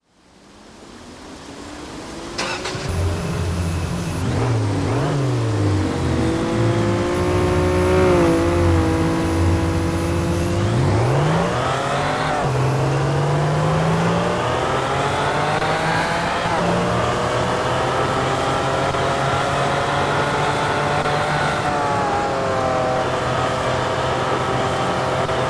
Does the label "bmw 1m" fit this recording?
Yes